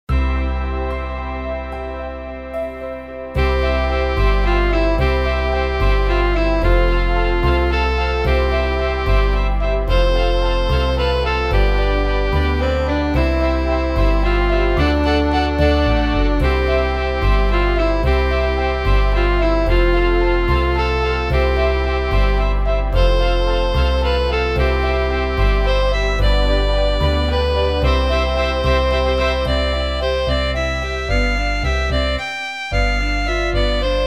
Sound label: organ